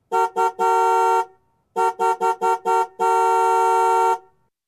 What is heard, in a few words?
Car Honking nervously